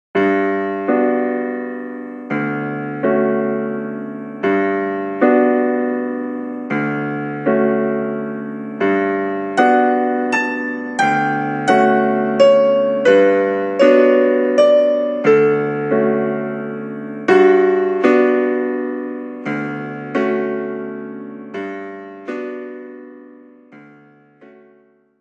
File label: Satie; Nokia; 9500